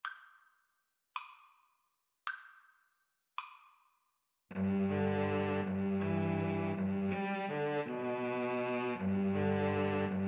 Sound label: violin cello, Violin-Cello Duet